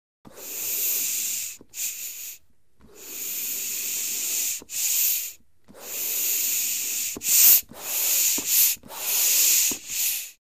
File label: Biking Low End Air Hi